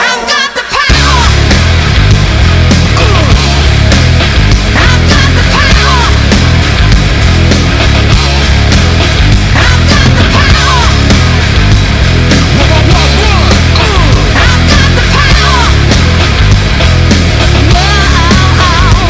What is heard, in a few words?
March